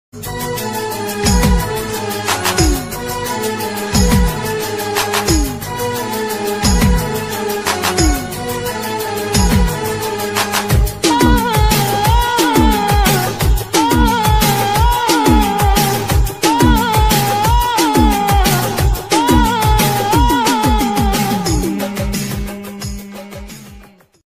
romantic ringtone

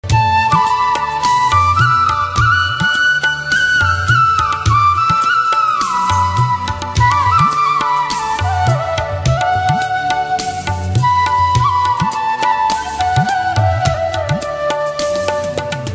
flute music nature flute nature flutemusic; nature beauti

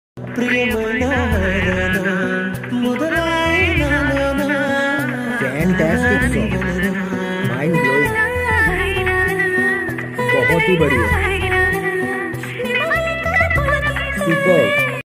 Telugu song; ramcharan

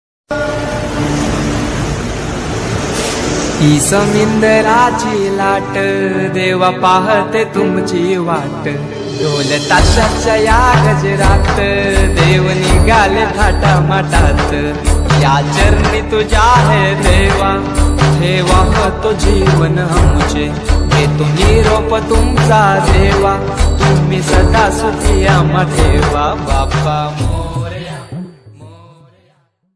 sad ganpati ringtone

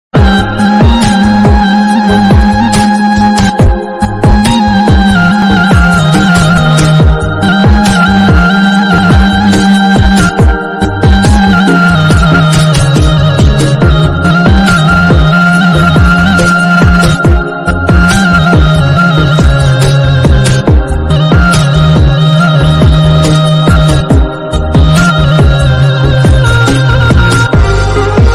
painful departure music bgm ringtone

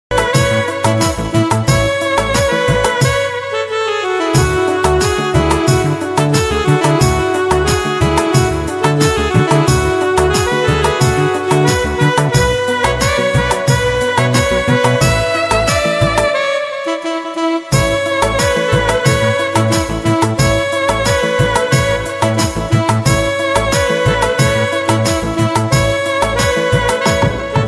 desh bhakti ringtone

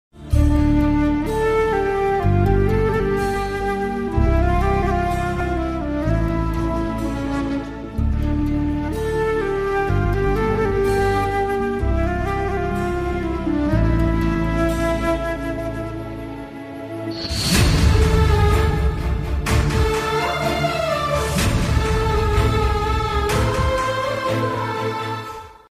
love bgm ringtone; prabhas; varsham; trisha; gopichand

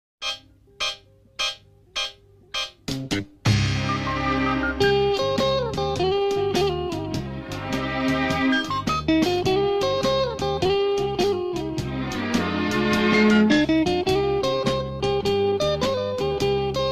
best love bgm bgm ringtone; bgm

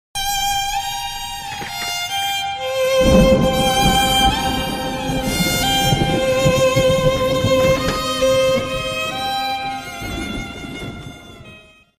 sad feeling bgm

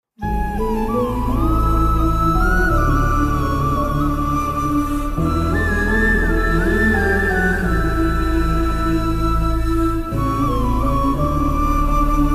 flute bgm; Sita Ramam; humanity wins; Dulqer Salman; Mrunal

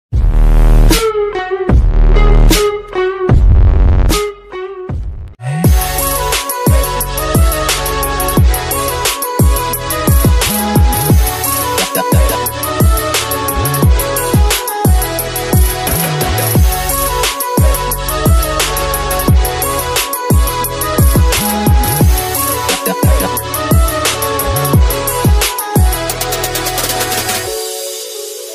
flute ringtone

